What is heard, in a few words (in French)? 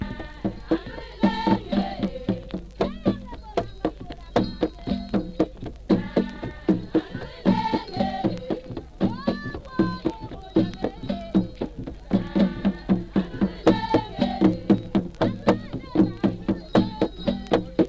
chant de femmes pour une naissance de jumeaux; Dongbo So Chant De Societe Secrete